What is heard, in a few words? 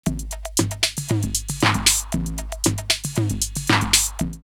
808 116, drumloops, DrumMachines; X-Static Goldmine; 90 s Sample CDs